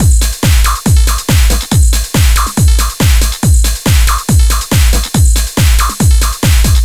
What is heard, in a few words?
Full Beat